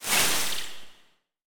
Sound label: Tap